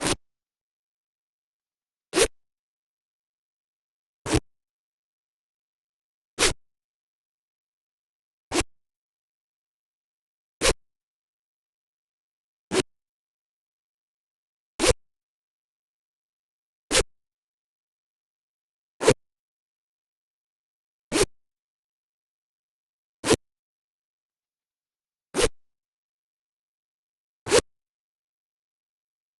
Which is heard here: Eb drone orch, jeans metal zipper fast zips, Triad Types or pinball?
jeans metal zipper fast zips